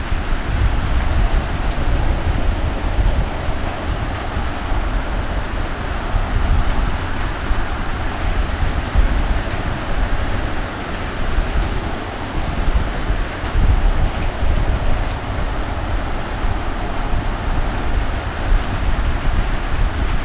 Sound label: Rain